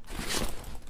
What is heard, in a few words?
Stash